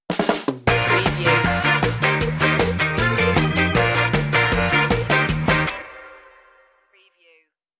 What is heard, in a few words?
ska town shimmy stinger